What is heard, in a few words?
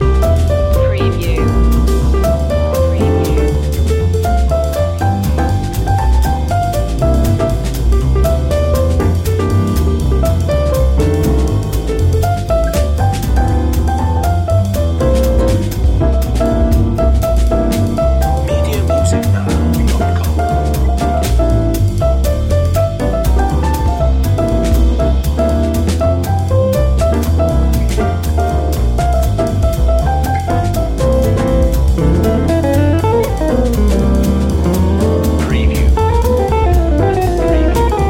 jazz; jingle bells